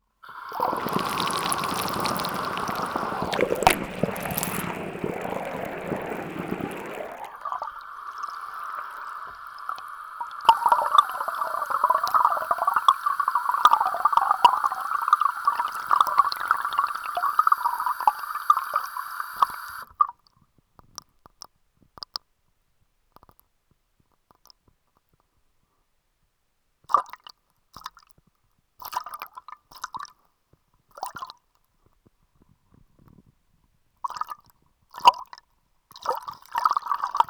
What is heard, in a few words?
RAW HYDROPHONE